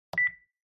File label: Telephone Hardware Button